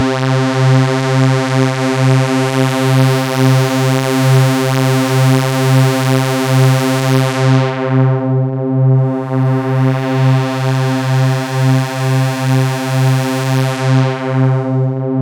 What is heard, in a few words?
C3, SynthLine; 90 s Sample CDs; NiceBeats; SynthLine VOL-1